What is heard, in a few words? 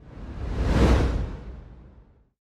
long Whoosh